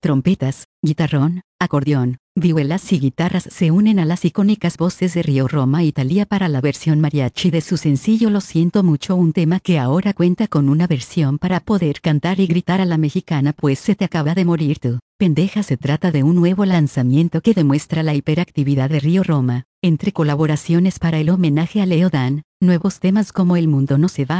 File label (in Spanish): Trompetas guitarrón acordeón vihuelas y guitarr